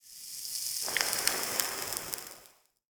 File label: burn paper